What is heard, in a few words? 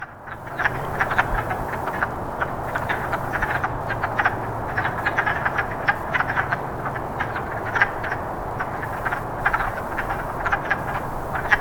Wood frogs